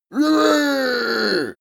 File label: male cheer yippieh